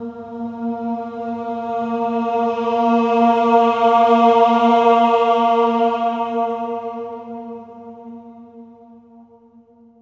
AH A#3, swell ahs, Symphony of Voices; Spectrasonics

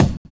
kick, Drum and Bass

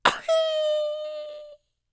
YELP, World Voices, ANDES FX

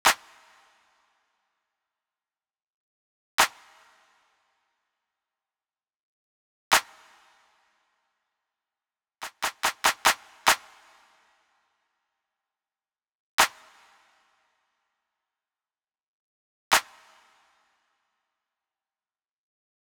clap, Drum Tracks, Smooth RnB

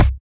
Kick, Genesis; ToeJam & Earl 2

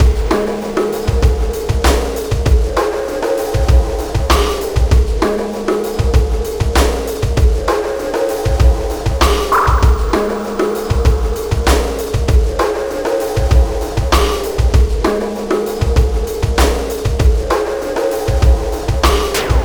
Oscillation Beat

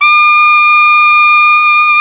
SAX ALTO MF, Tube

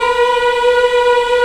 African Mm f; Roland LCDP11 Africa VOL-1

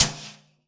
ocean, world percussion; Best Service ProSamples vol.52 - World Instruments 2; MIXED PERCUSSION

